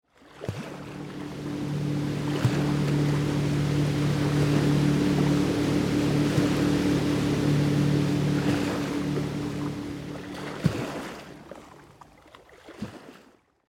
Motorboat passing slowly gentle waves breaking on a beach